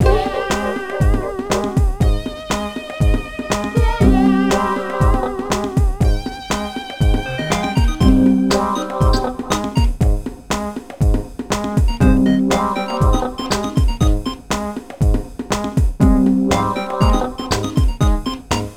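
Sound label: background music puzzle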